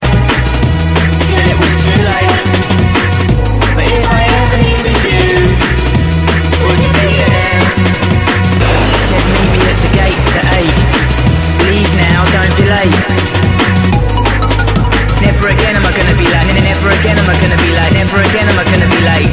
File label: Drum n Bass